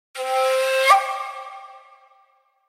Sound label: Panpipe, notifications; Lenovo A316i